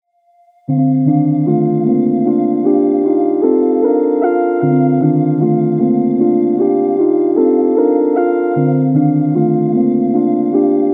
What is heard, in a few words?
bip